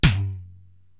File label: TOM Lo Vox, Korg TR-Rack